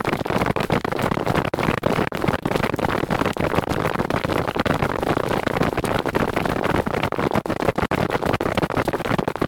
manypeople dash

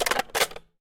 large rifle magazine

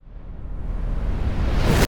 slow motion end

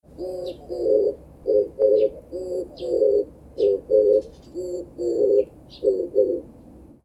streptopelia orientalis cry

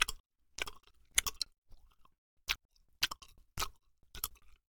gum

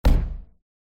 wall hit

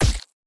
arrow pierce, battle

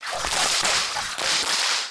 whip attack

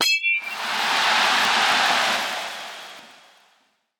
metal bat cheers

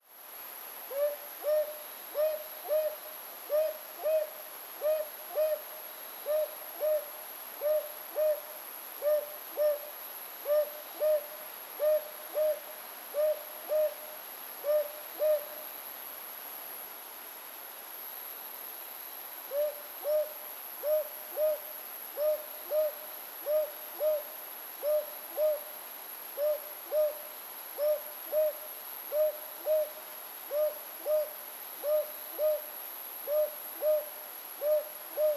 ninox scutulata cry

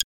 cursor, button

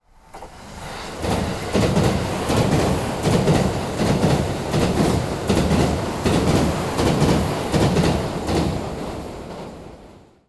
train pass

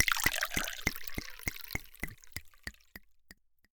glass wine

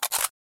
machinegun magazine remove

